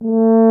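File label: brass tuba; Miroslav Vitous; Solo Instruments VOL-2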